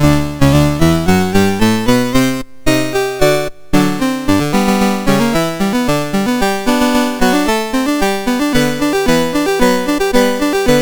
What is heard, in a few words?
On Wisconsin, MOS6581R2